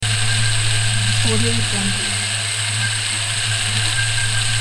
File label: little drone idle